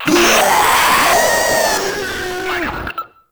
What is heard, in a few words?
Proto Infested Runner Vocal Attack; Warframe